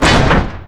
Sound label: mine